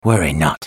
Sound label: attack; BlindMonk